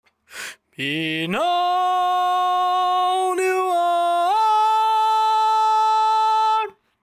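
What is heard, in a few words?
baritone, barbershop tags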